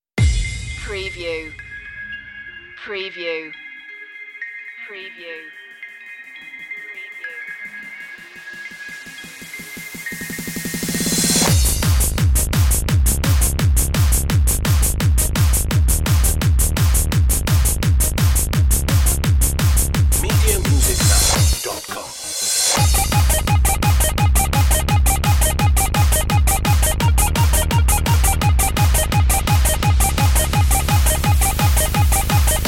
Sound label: bubble gum beats